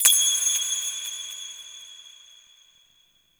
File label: Hand Compass Objective Notification; Ambience; Gameplay; Warframe; Duviri